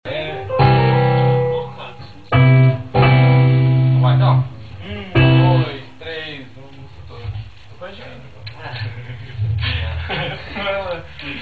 samba

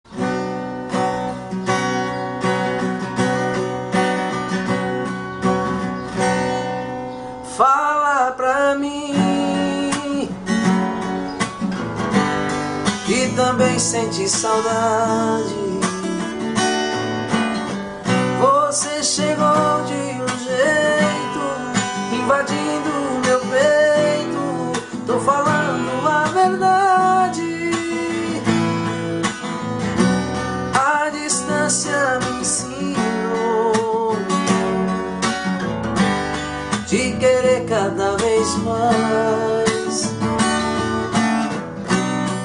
forro

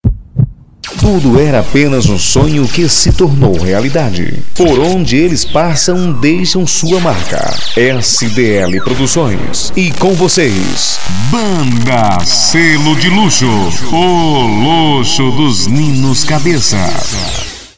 vinheta luxo

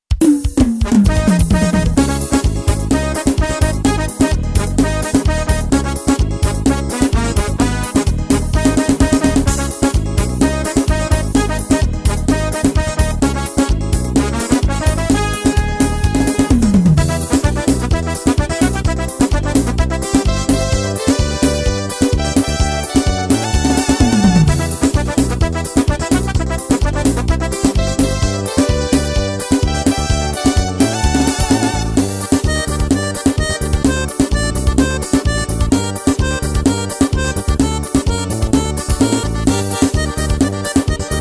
forro rapido

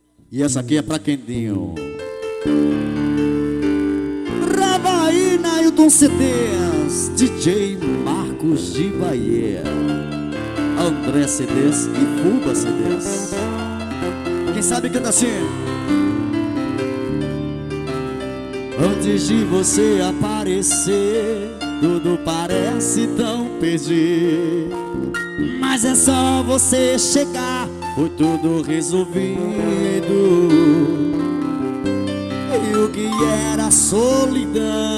pancada ao vivo medios graves

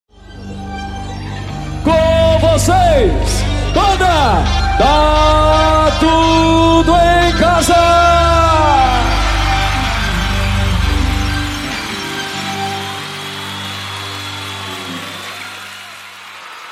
abertura ao vivo

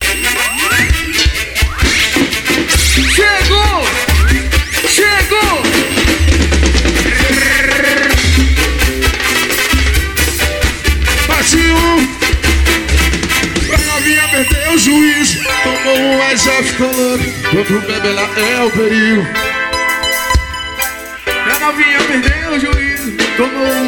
samba

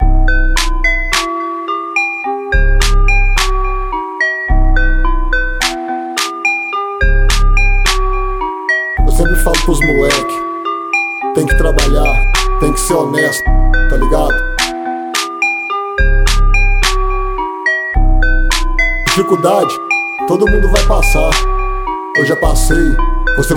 interludio

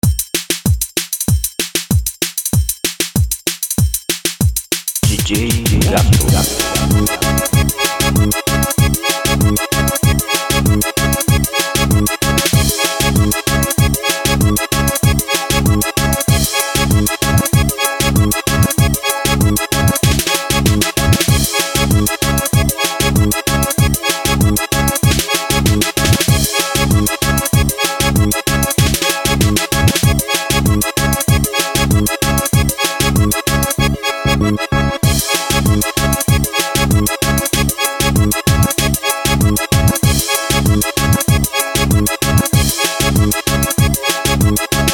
batida

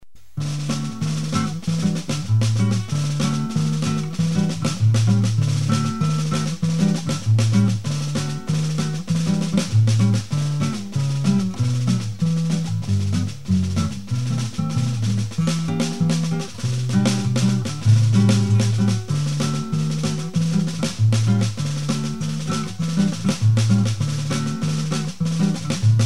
fanfarra

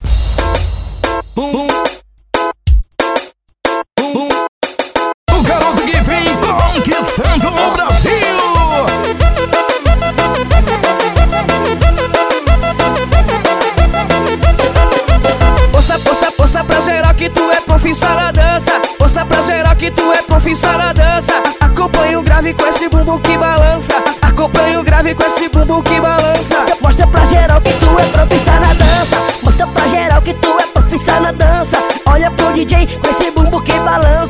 acompanha o grave

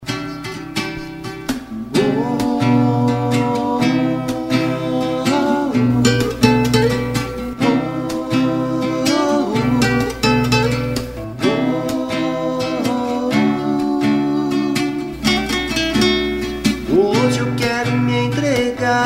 musica gospel

